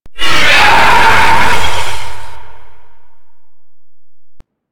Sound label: Golem Grenade Head Attack; Warframe